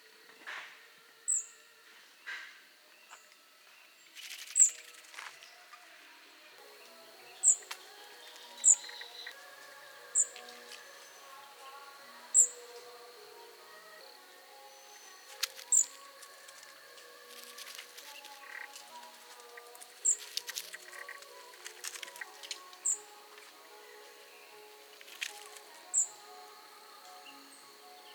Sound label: Scaly Thrush Zoothera dauma horsfieldi